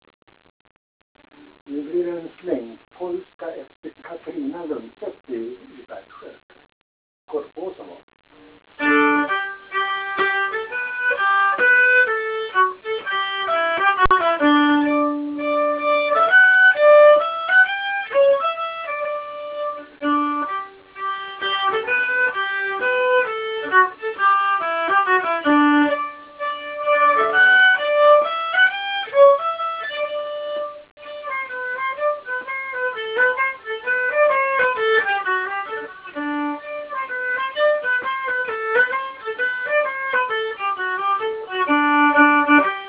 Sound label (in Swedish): Slangpolska; Arkivinspelningar